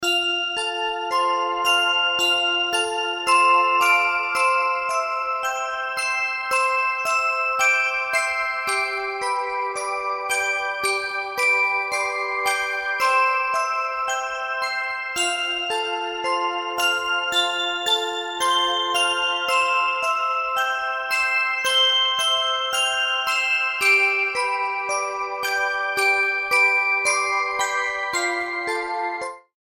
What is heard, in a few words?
cute kids music ringtone